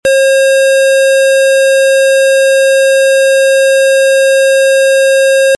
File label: tone